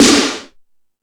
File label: Lazer Drums, drum machine